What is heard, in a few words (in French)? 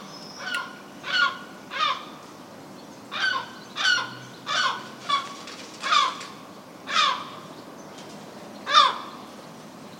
goeland brun